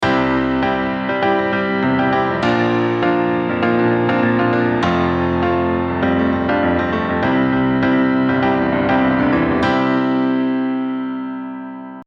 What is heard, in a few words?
piano; Πιάνο